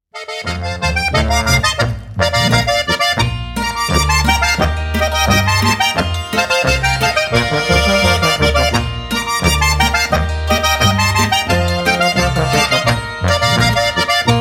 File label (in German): Boarischer